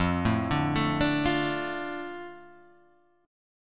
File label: D Minor F, chord